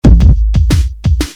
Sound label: loop